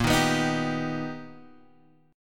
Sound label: B Augmented Major 7th A sharp, chord